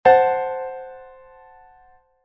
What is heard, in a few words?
F♯°, Chord Inversions